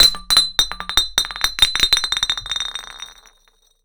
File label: Bottle falling floor